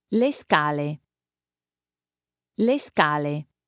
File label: scale